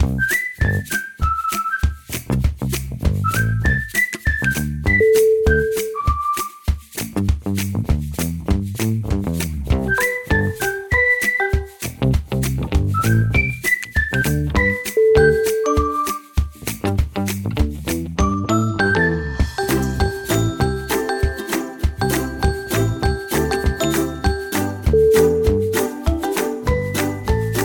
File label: happy and playful